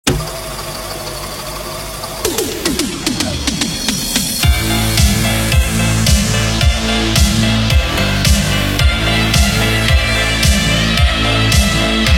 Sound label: 80s Retro Wave; OST Audio